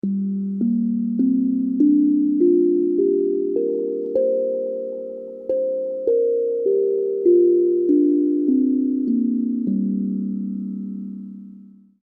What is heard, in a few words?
haps gm, Hapi Drum; Perc-melodisch